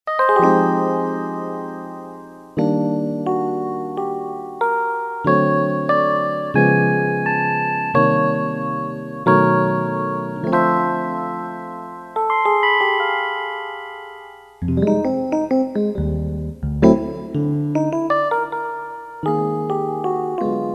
E. Piano, Casio CTK-601 Tones

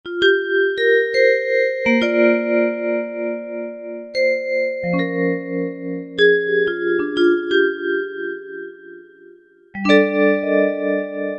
Vibraphone, Roland SRX-07; Roland SRX-07 Ultimate Keys

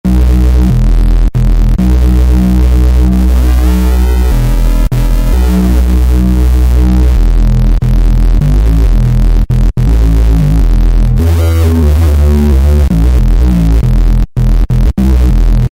Korg microKORG Ring Sync Bass; Factory Voices

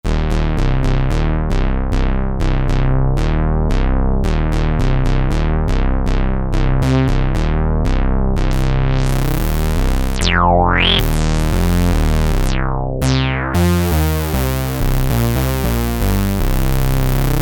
Big Bass, Novation XioSynth 25

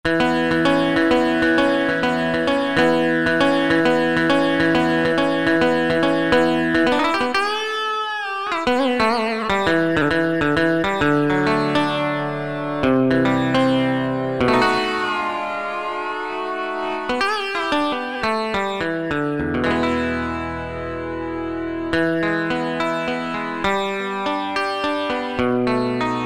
E-mu Proteus VX; Cast Teller

